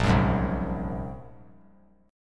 Bb 7th Suspended 2nd Sharp 5th Bb; Bb7sus2#5; strummed; 10,13,11,10; JGuitar

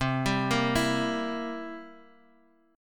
C 7th C down Guitar Open G

